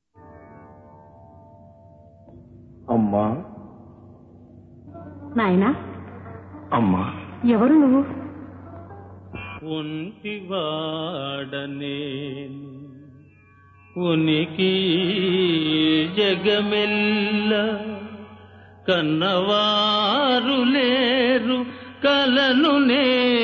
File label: Padyam; SriVenkateswaraMahatyam(1960)